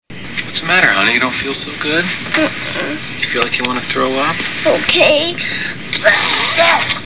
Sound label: throw up